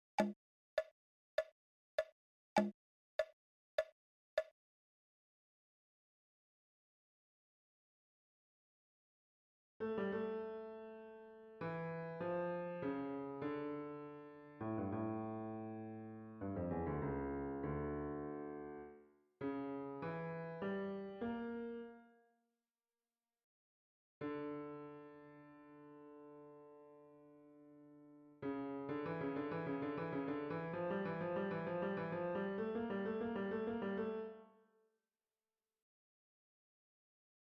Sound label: Toccata Fugue left